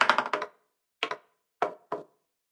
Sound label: ball Bounce, roulette